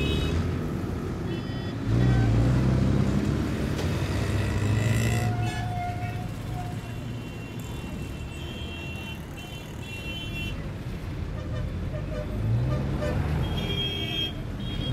busy traffic noise; dyslexia

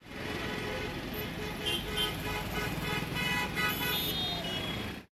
cars noises; environment pollution

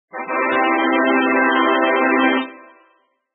win; grade5

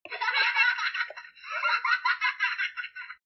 Laughing; ramailo mela

